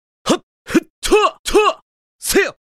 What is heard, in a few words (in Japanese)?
通常攻撃（ワンド）「はっ！ふっ！とぁ！とぁ！せや！」